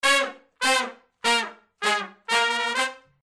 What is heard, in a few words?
brass loop